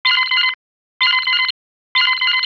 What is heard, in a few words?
incoming projectile alert